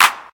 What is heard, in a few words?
modern rap clap D key; single hits one shots